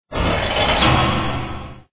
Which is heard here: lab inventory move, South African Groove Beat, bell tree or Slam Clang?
Slam Clang